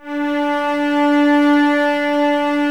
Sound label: String Ensembles, Cellos, No Vib; Miroslav Vitous